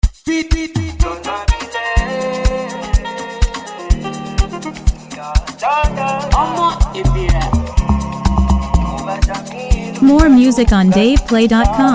Afro Marapiano

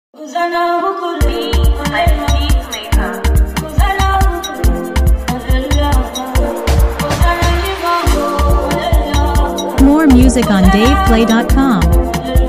Dance Beat

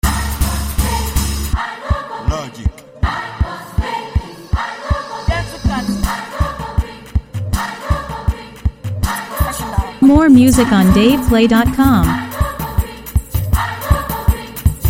Beat

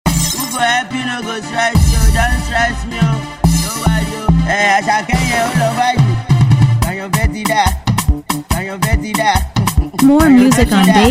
Beat

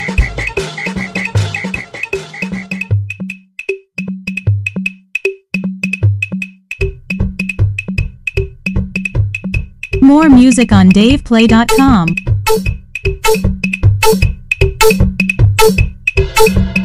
Cruise Beat

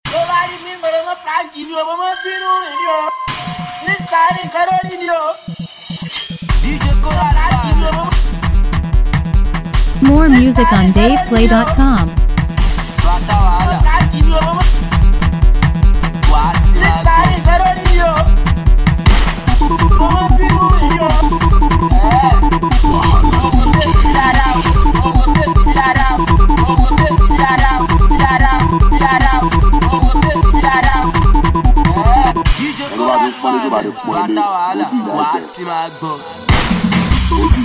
Cruise Beat

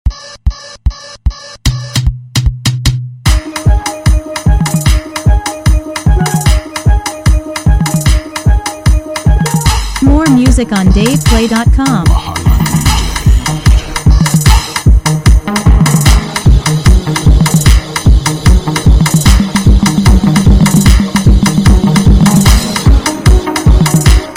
Free Beat

